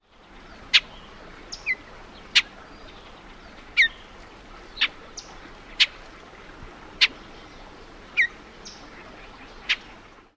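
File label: whistles other calls, Bullock's Oriole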